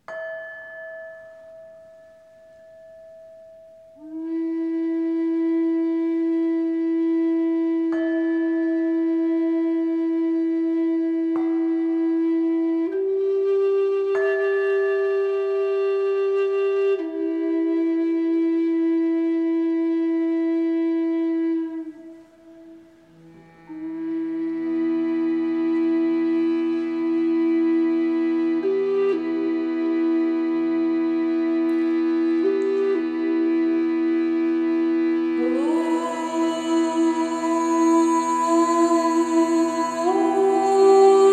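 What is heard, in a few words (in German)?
Gesang mit der Liebesflöte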